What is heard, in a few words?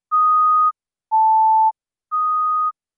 Pure Tone